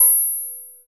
HH, HH Synth; Sound & Vision - Gigapack II; HiHat Synth

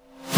REV Brush, Roland JV-2080

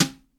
SLAP, BRUSH KITS; Crypton - DrumBase5000

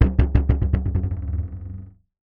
Marbles & Framedrum; musicradar; contact mic madness; Raw

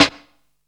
tiny, snares; Zero-G; Funky Elements

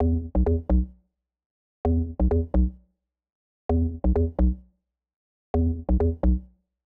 Bass Loop 130; Music-Fx Loops 130; Equipped Music; Loungin House

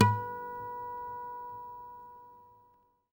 AC HARMN, Guitar; Quantum Leap; Guitar & Bass

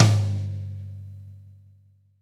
V-Pop Tom 43, Roland BK-5 Toms

